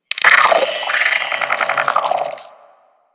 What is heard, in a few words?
Sentient Brachiolyst Idle; Enemies; Barks